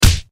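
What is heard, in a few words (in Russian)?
звучная хлёсткая пощёчина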